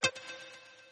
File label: points low; celebration Events